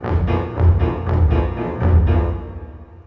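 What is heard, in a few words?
fairground 116; Orchestral Loops; Best Service ProSamples vol.33; AKAI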